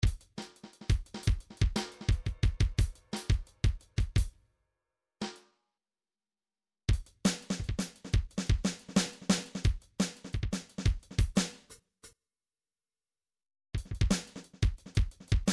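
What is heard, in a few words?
drums pattern, midi; Paul Kodish Dangerous Drums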